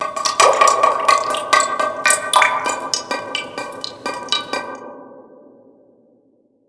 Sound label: random drips